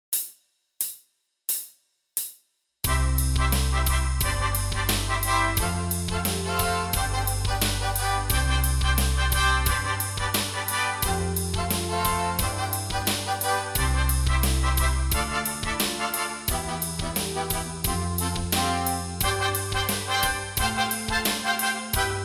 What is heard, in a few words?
Concert C Scale with Thirds